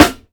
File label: Raw Snare Drum D Key; snare drum samples